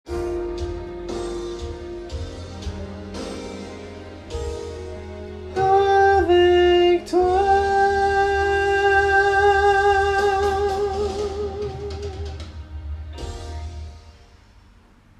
harmo fin

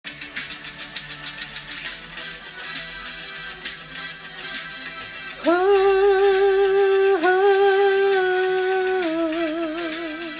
il changeait la vie fin, Vocalises de fin

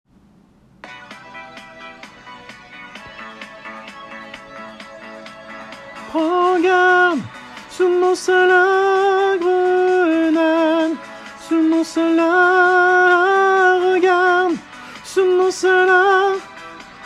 harmo, Harmo refrain; SPECTACLE « VOIX DE FEMMES »

